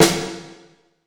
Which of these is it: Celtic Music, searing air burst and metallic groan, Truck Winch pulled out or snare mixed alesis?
snare mixed alesis